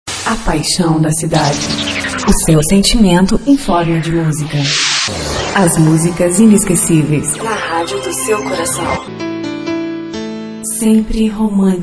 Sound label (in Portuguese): Vinheta Romanticas; Brega; Zuacao